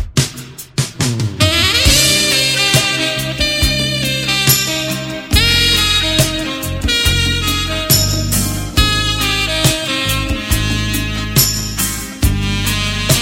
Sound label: amazing saxophone